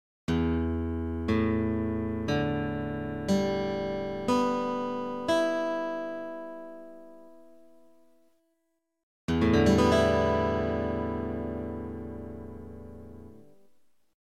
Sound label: Guitar Standard Tuning